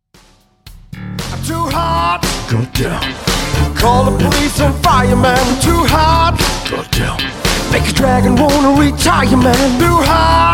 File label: sax vibes party band